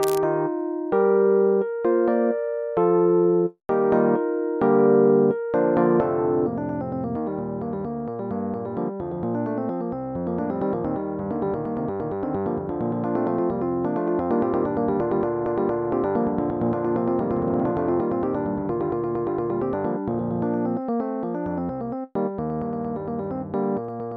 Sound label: Piano Solo